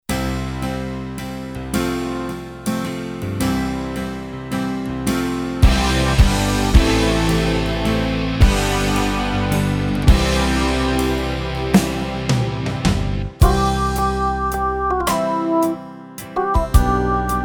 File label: 6/8 Slow Ballad